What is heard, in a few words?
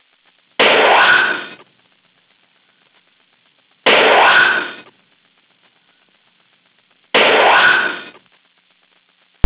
photon torpedoes; startrek